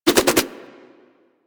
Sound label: Nunchuck Spin Whoosh; Warframe; Infested